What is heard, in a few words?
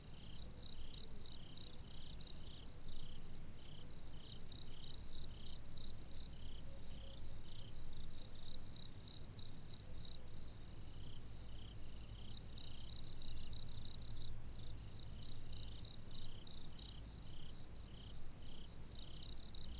outdoor janitor room night insects; anemoi